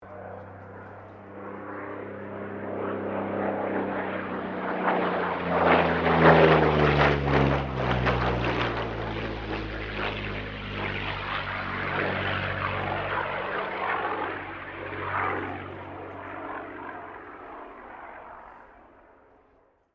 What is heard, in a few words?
Aircraft P-40 Kittyhawk pass, WW2 Kittyhawk or Warhawk fighter plane; 1940s; Airplanes; Vehicles